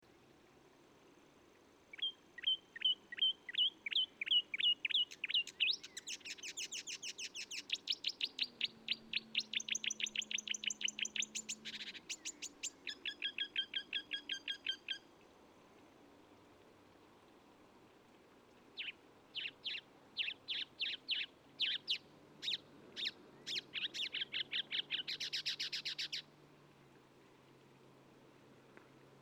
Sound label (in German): Sumpfrohrsänger Gesang